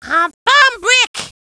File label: Bomb Brick Pickup; Oh, sweet! A Bomb Brick!; Gruntz